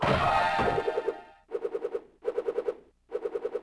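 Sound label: check point; Crash Bandicoot The Wrath Of Cortex; XBOX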